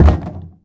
underground cube soft

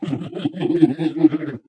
ballbot chuckle

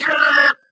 ballbot short impatient

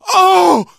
hurt major, survivor voice, manager

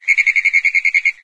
frog long, frog groups; ambient; random animals; Left 4 Dead 2; Source Engine

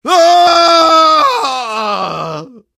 pain crticial death; engineer; source engine; tf

